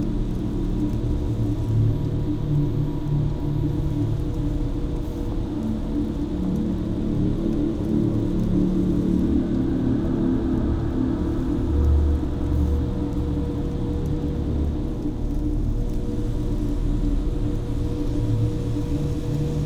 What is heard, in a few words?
ship battleship idle; Stellaris; toxoids; ships